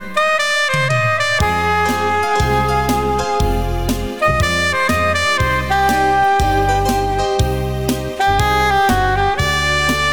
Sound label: alto; Простых мелодий для саксофониста